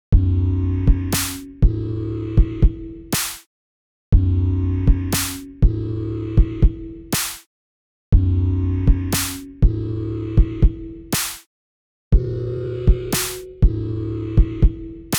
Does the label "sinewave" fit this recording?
Yes